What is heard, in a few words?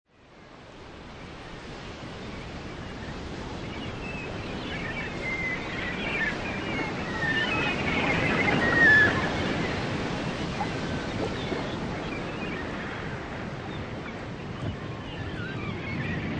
Beach With Seagulls, Daytime beach. Seagulls, waves coming in; Seagulls, waves coming in, water lapping; Environment; Lynne Music